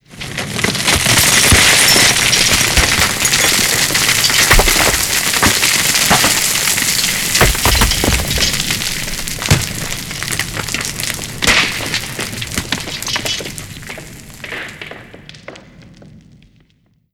rock dirt debris long; Call of Duty Modern Warfare

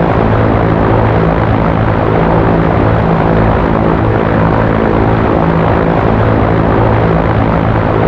Aircrafts Engines Mi8 Tail Rotor; DCS

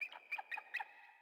sting eagle; Rust

